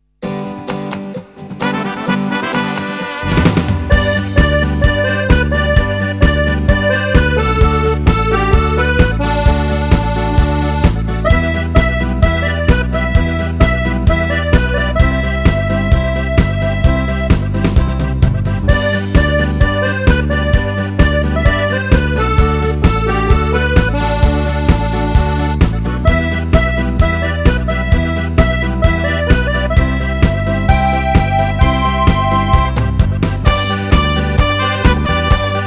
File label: Polka